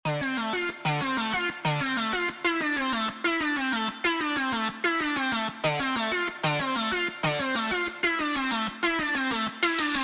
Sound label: MAIN THEME 8bit